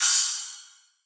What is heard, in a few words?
Crash, Hip Hop Drums - One Shots Cymbals; Cymatics - 9God Hip Hop Sample Pack